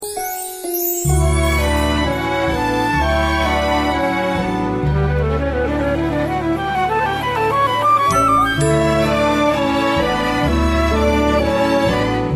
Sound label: Accompaniment Only